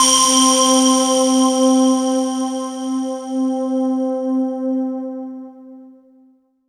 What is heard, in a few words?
C4, Synth Line; 90 s Sample CDs; NiceBeats; SynthLine VOL-15